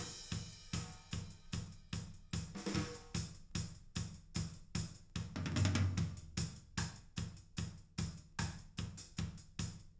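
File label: with drums live rec 5cm; SASSEC07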